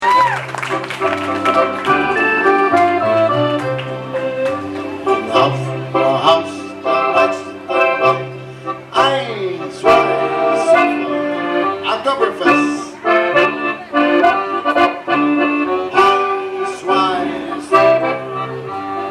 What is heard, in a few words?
German Accordionist German Medley